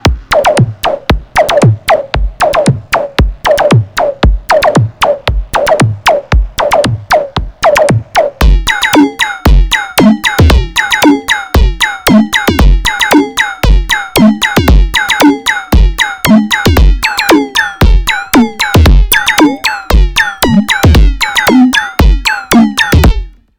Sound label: Formant filter drums